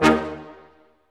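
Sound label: BRS, Brass Staccatos, Brass Ensemble; Roland L-CD702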